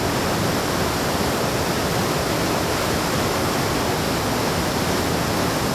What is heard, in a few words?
waterfall outside, ambient